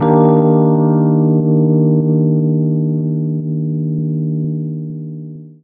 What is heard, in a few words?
Chords Tones; Equipped Music; Loungin House